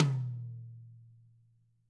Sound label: DW, Double Platinum Drums; ILIO; Double Platinum Drums 1